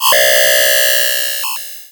modem efeito sonoro

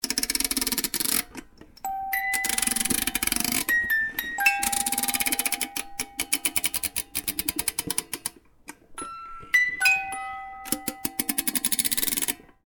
caixinha de musica

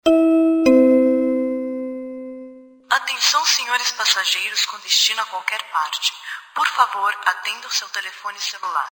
aeroporto, sinal no aeroporto

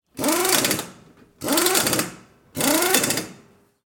chave eletrica

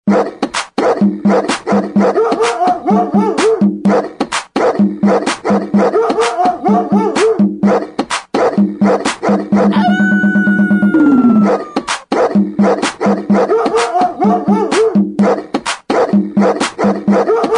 funk do cachorro

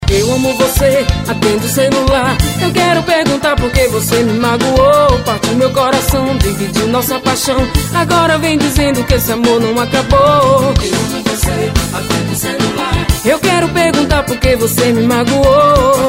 forrozao atende o celular

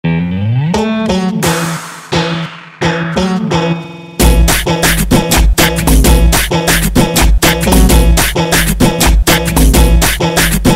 funk

